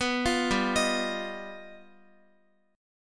G Augmented 7th, Guitar; arpeggiated

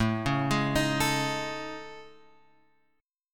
A Major 7th Flat 5th, guitar; AM7b5 Chord {5 4 6 X 4 5} arpeggiated

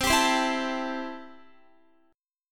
C 6th C down Guitar; strummed

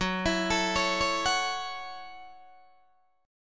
F sharp Minor 7th F sharp, Guitar Open D

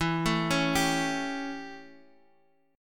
A 7th E, chord

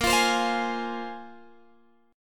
A sharp 7th Suspended 2nd down Guitar Open G

